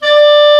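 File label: Clarinet mf D4; Multisamples; Digital Sound Factory Virtuoso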